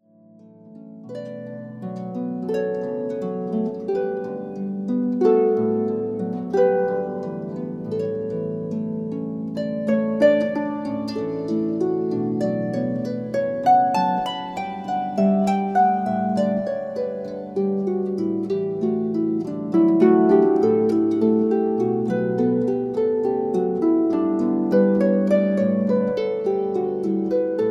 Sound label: Pachelbels Canon